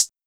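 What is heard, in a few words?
Hi Hat Closed, Korg SR-120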